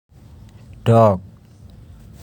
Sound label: dog